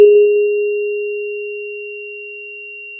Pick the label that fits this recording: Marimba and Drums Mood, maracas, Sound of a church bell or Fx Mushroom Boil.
Sound of a church bell